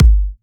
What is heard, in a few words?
Bassdrum; Vengeance Samples